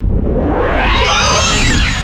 sci fi laser gun charge and blast; lasers

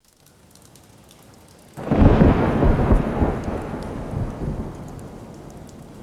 distant thunder boom with light rain; weather

